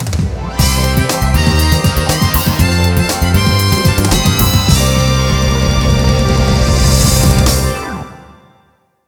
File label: Mini-Game Win; Frogger's Adventures The Rescue; gamecube